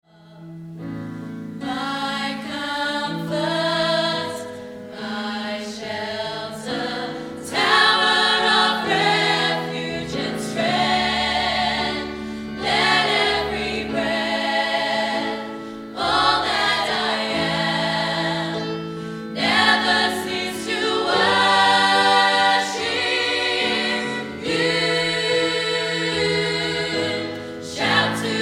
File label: Gospel, Gospel Choir; choral